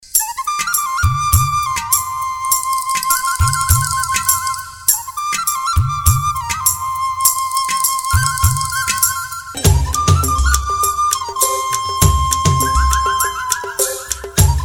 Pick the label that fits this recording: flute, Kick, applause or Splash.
flute